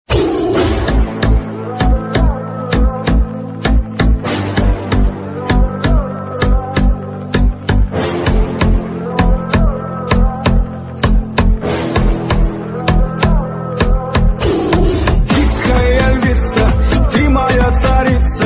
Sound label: Trap Music Ringtone